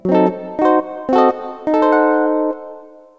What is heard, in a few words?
guitar